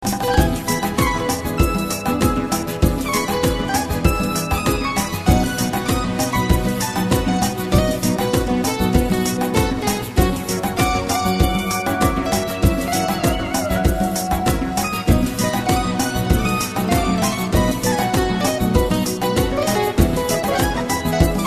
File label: Reggaeton